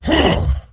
Crusher Attack; AnubarCrusher

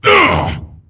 Attack Medium; Jaraxxus

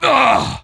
Wound Medium; Putress

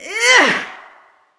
Creature Attack Medium; Eydis

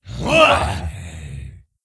Attack; General Vezax

